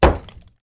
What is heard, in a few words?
Large rock hit hard ground